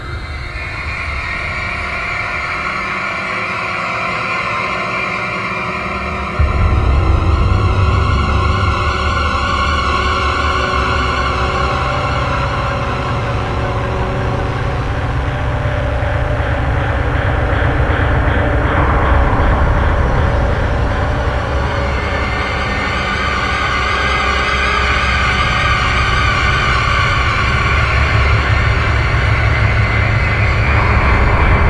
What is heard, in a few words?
scary